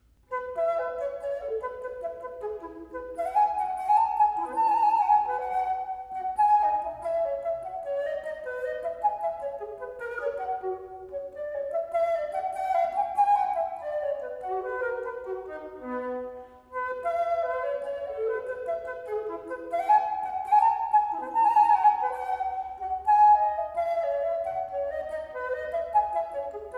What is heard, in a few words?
Cello Suite No. 1 in G Major (for Baroque Flute) Gigue; Bach; BWV 1007; Arr. W. Hazelzet for Baroque Flute